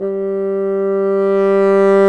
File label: BASSOONS, Bassoon Swel; Roland LCDP04 Orchestral Winds; 90 s Sample CDs